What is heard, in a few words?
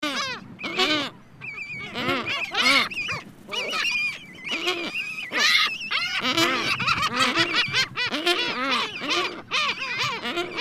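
Pallas's gull voice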